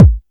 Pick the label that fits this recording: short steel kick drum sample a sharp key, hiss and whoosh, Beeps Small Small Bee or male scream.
short steel kick drum sample a sharp key